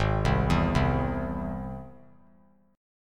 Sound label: G sharp Augmented G sharp; G#+ arpeggiated; JGuitar